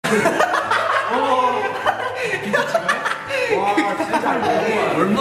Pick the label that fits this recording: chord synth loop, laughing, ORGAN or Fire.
laughing